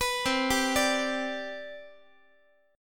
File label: C Major 7th Suspended 2nd C down Guitar, chord; CM7sus2 arpeggiated; x,x,14,10,11,13; JGuitar